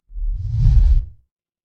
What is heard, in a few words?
transition sweep